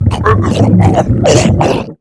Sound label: gurp; quake2; mnhcoop; quakeguy